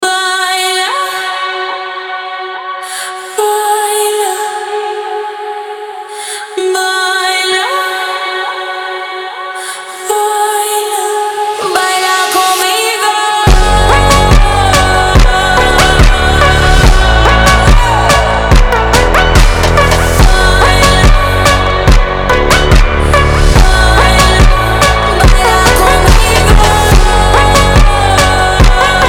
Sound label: Phonk Slowed